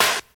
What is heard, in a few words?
Treble-Heavy Acoustic Snare Sample C Key, steel snare drum sound tuned to the C note